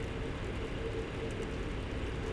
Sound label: vent; Mafie 1